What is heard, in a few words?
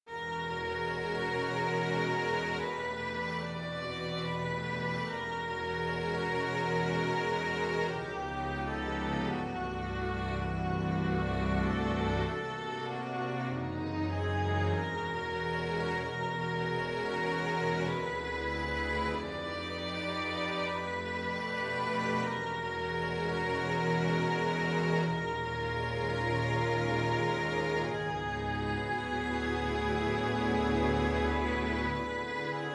strings